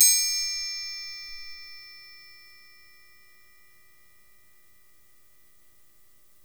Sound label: TRI, PERC; Sampleheads; NYC Percussionworks; 90 s Sample CDs